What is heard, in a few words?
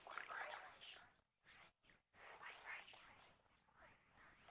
Arabic, flames; Quantum Redshift; XBOX